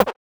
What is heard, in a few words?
Percussive UI SFX